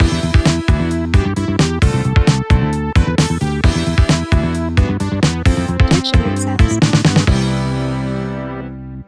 New Wave Host Alert

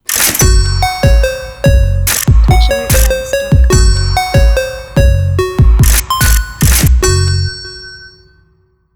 Trap v2 Donation

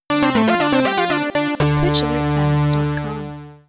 Synthwave Sub

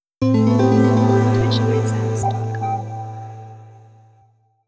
Atmospheric Follow

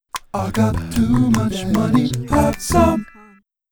Goofy Donation

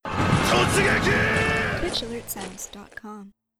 Anime Raid

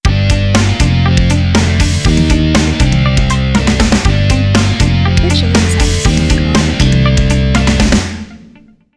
Rock Sound Host

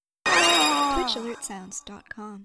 Anime Host

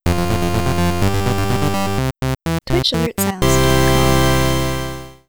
8-Bit Cheer

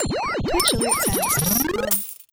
Retro Cheer

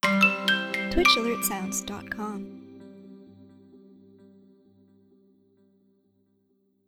Simple Cheer

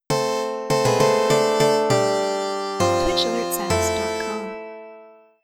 Medieval Host

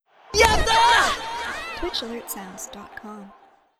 Anime Subscriber

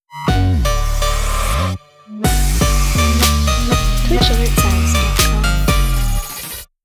Trap Cheer Sound

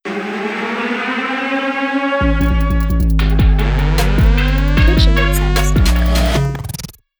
Trap Subscriber Sound

